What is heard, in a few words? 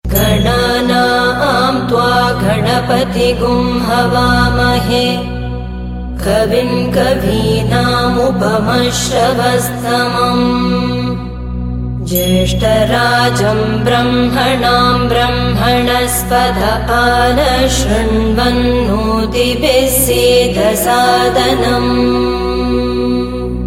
mantra